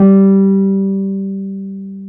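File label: Wurly G 4, piano; Keyboards of The 60's and 70's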